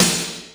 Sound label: SNARE, DRUMS; Crypton - DrumBase5000; T1 DRUMS